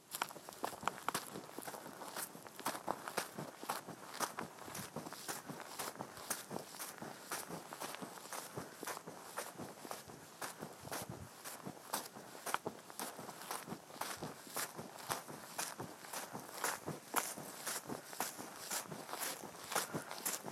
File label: Walking In Dirt On Side Of Country Road